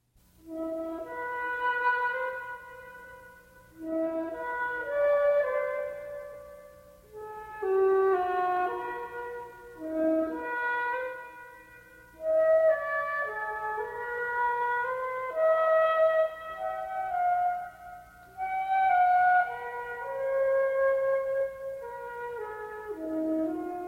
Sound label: solo for flute